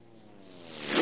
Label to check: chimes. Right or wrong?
Wrong